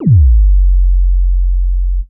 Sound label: Subby